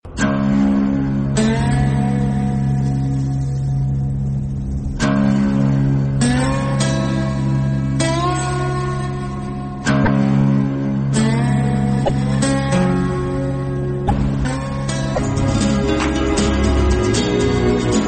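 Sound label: background music Telugu Ringtone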